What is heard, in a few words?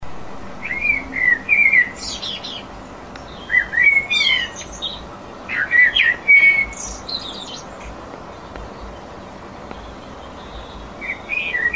blackbird solo int mic